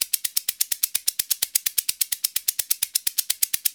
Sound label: Shaker Loop, 128 BPM; Vengeance Samples